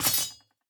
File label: minion battlefoley metaldrop; smite; npc; roman archer